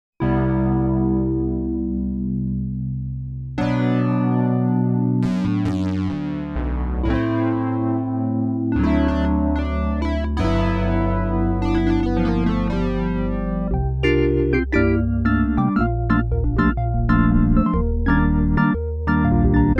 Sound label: keys